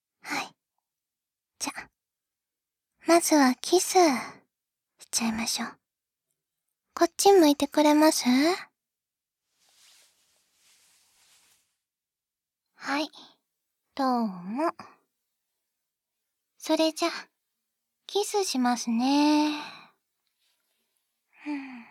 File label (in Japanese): キス